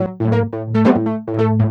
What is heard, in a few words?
TECHNO SYNTH 140; Keys